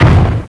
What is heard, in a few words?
explode mini; Cube